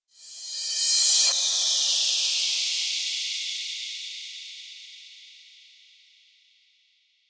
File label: woopy floopy